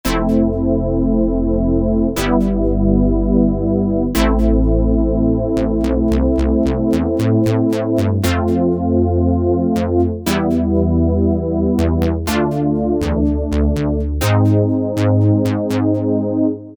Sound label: Poly Line, Korg MS2000; Factory Patches